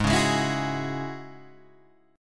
Ab 7th Suspended 4th Sharp 5th Ab down Guitar Open G